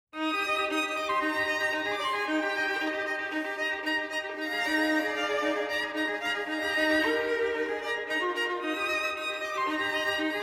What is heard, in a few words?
Moderato Con Brio Violin Sextet; Succession Season 2; HBO